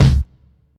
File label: thick kick sample c sharp key